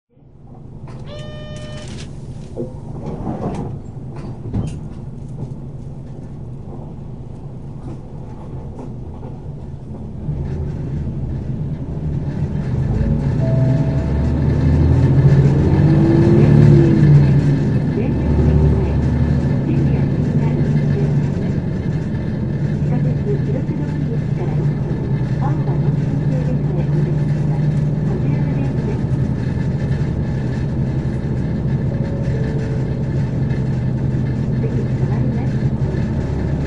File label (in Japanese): 走行音, 日野 P-HU235BA; 仙台市交通局; 霞の目; 中央警察署商工会議所前→電力ビル前